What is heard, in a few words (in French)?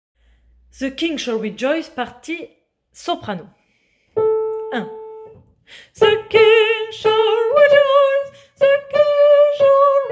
partie soprano